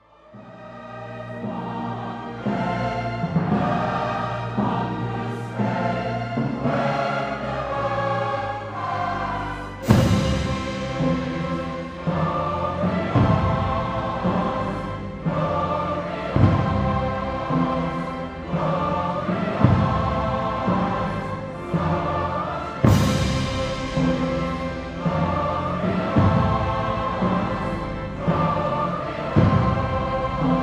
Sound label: Anthem Peace triumphant peace